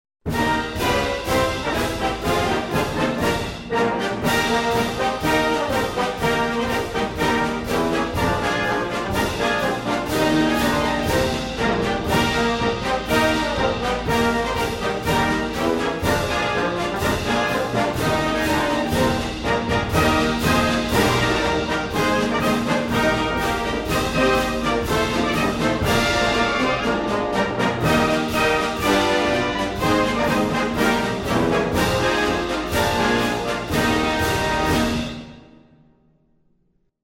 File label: army song instrumental